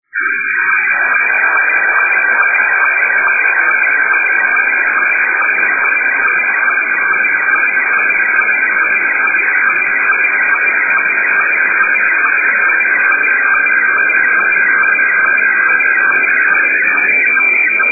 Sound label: sstv